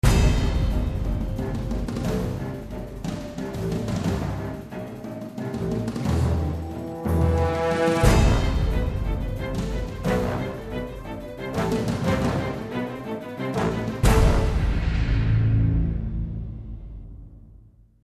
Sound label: Action